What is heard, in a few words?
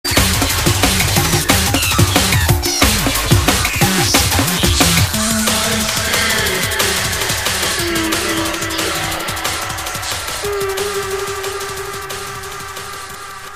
Drum and Bass Jungle and Old Skool, UK Bass Radio